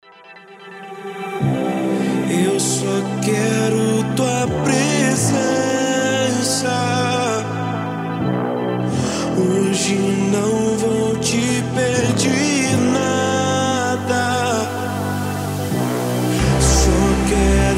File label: remix gospel